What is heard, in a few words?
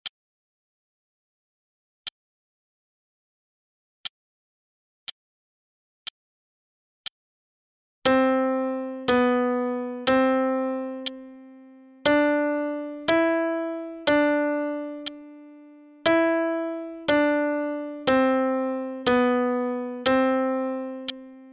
Warm-up in 2nds (qn=60); Faber Adult All-in-One; Adult All-in-One Book 1